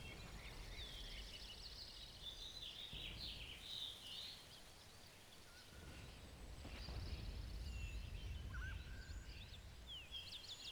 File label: no battle; WAR